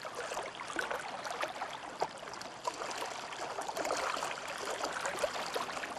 amb dock water; angel city; Titanfall 2

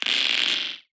bullet energy titan shield spang cluster

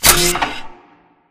Fence Flip Start; Titanfall 2; Boomtown

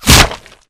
imp bullet lightballistic headshot human; Titanfall 2

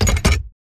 Metal Jog; Titanfall 2

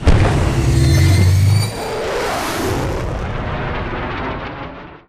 crow fly away; classic mp intro; Titanfall 2

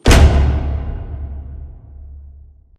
cooling panel stop; Titanfall 2

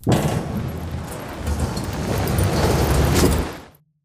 Assembly Vent Slide; Boomtown; Titanfall 2

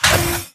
Mvmt Servo Light Short; AI Stalker; Titanfall 2

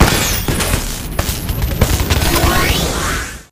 Reaper Nuke Destruct Warmup; Titanfall 2

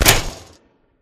Fence Flip Stop; Titanfall 2; Boomtown

